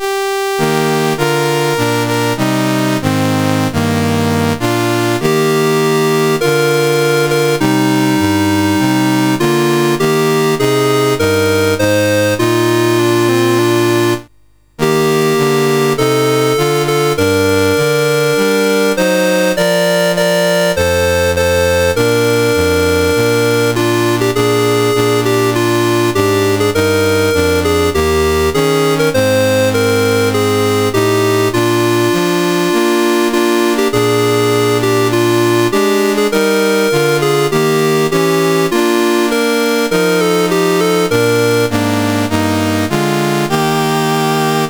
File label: Canada National Anthems, CSG8580R5